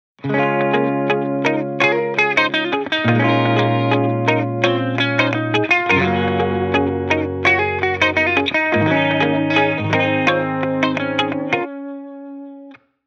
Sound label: guitar loop 85 Db; Pop; Guitar Stacks; SD PASADENA Pop Sample Pack; Slate